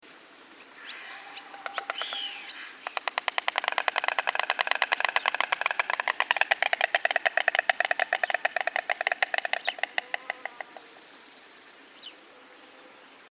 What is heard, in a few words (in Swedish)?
Vit stork